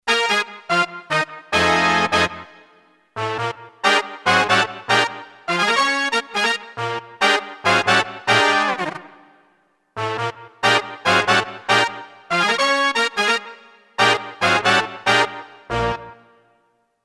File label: Funky Brass Section; gsi gemini new samples